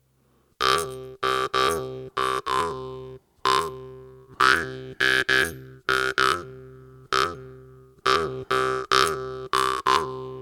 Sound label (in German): Pralltriller Mordent